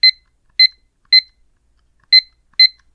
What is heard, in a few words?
set kitchen timer, タイマーを合わせる